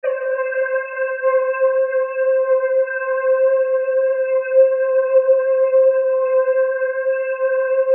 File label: Synth One Shot